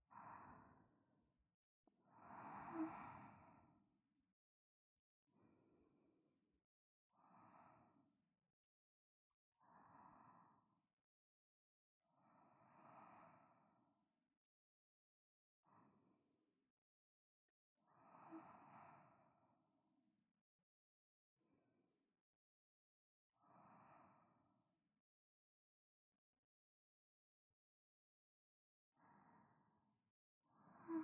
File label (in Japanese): 添い寝ループ; メイドのティア; KU100